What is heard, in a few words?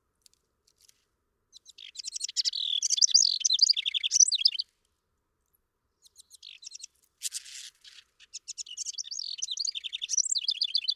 House Wren